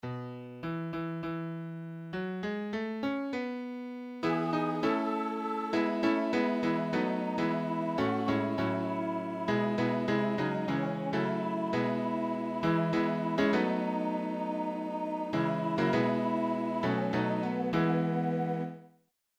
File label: Shenandoah